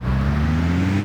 start, pod; Cosmic Rage; The Experience-light

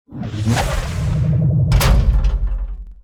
Salvage, salvaging; Cosmic Rage; The Experience-light; ships

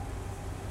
idle, car; NovaSounds; NovaRealm

